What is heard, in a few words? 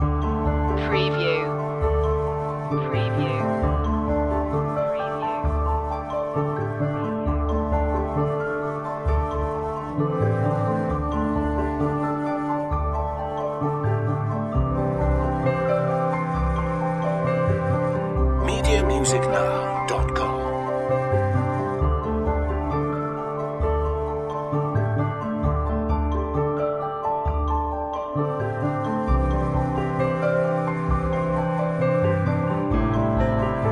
eastern breeze loop